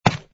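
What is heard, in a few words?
footstep stone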